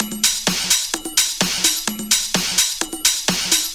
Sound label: Minimal Loops